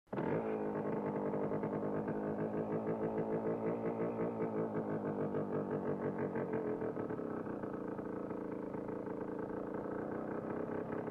Motorcycle starts, waits, turns off

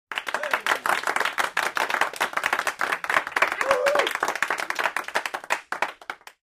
Applause in a small room; standing ovation

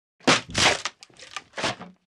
Board breaks; wood

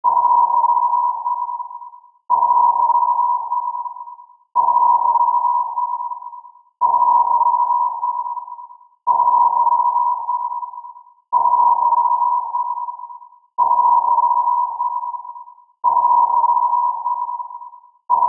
Submarine sonar

